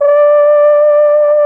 BARITON; C4; Best Service; Alpine Volksmusik

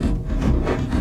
Vam Wak, Frame Kendang; Sonic Foundry (Sony Creative Software) - Friendly Fire; Drums

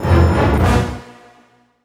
GRO, Orchestral Loops; 128 PREDATOR

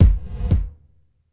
Gated Kick, Gated Reverb; Univerb; musicradar

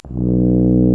tuba, brass; Solo Brass

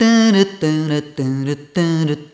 Rhythmic A

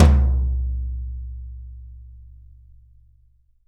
YAMAHA, brush drums; Big Fish Audio; Ross Garfield Drums VOL-2; T-YAM BRUSH

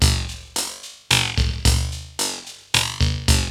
LOOP, TECHNO; TECHNO-BOX; ELEC-LOOPS

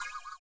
CYM, Ethno Techno; ILIO - Ethno Techno

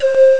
cosmo flute; Amiga Soundtracker Sample Packs

